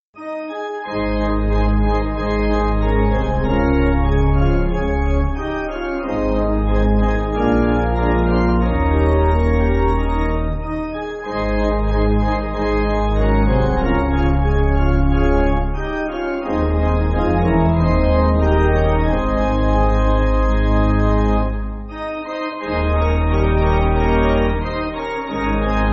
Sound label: Pipe, Pipe Organ, Music Backing